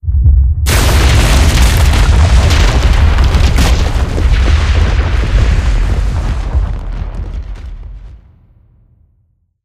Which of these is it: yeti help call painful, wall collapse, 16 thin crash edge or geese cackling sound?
wall collapse